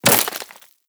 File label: debris wood, bullet impact debris